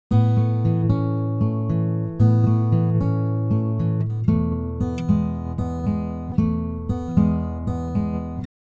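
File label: acoustic guitar off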